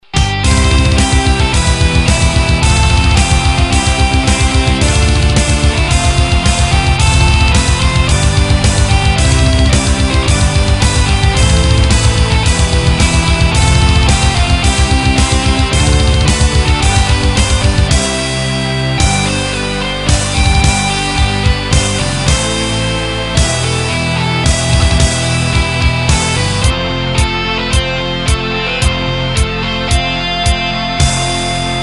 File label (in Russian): Gimn Rossii Power Metal remix